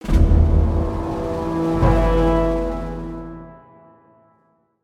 Fail; Agathia; Age of Chivalry; Windows

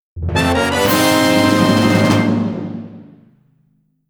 Item Fanfare; Legend of Zelda The A Link Between Worlds Sound Selection

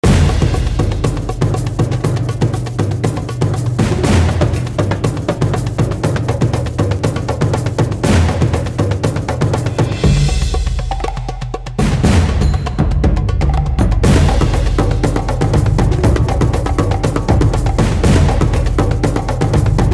action music action 120bpm

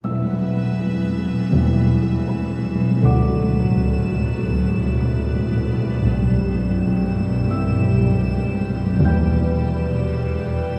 Sting Weighty

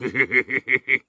Woo; pucca n friends ios

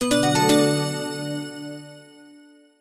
complete

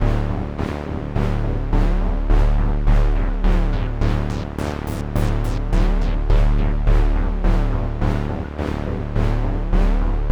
angry moody search loop; the warriors 2005 ps2

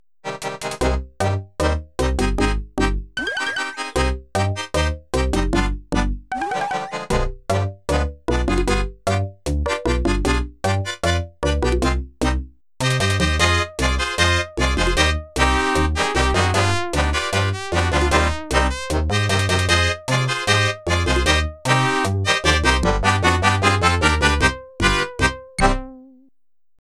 Tango

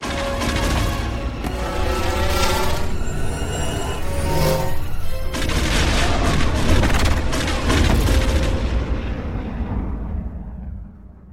Outro; lego star wars

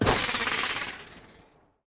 Leap

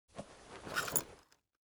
Get Glasses; yesterday origins gamerip 2016 switch ps4 pc ios macos

